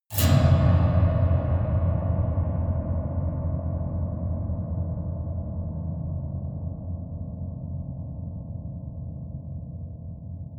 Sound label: piano twang multiple fast dull